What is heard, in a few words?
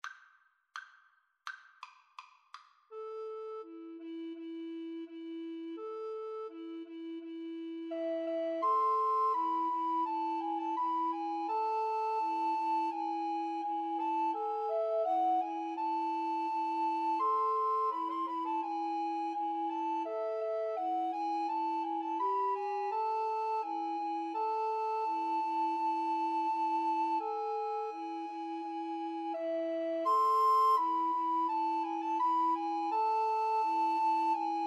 patapan, Recorder Trio